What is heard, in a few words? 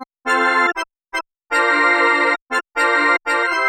CMI ORGAN; East West; Twisted Textures-BT